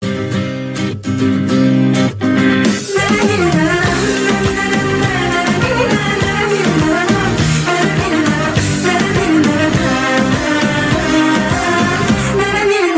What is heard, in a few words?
Dizi